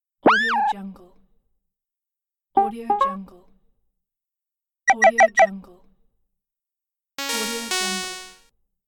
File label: notification pack for video games; productionelements